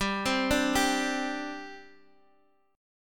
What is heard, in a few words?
G Suspended 4th G down Guitar Open G